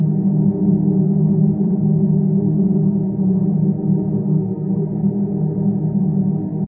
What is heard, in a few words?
Tonal hum; Doom 3